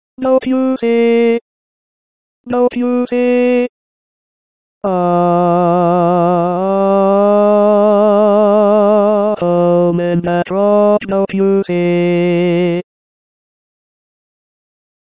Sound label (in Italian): tenore; I Got a Home in Dat Rock